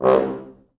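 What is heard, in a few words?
4 French Horns, Horns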